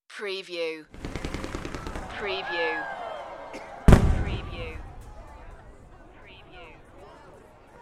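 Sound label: Massive Firework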